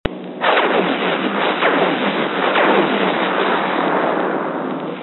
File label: phaser